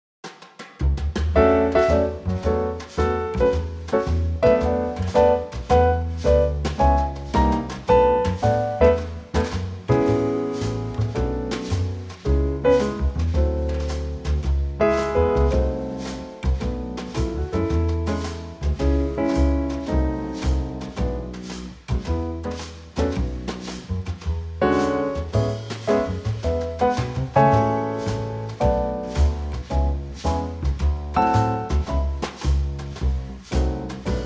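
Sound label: Trio A, Backing Tracks for Male Vocals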